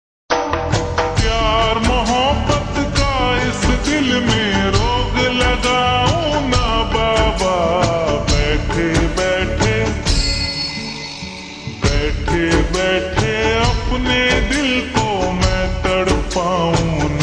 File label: Ringtone slowed and reverb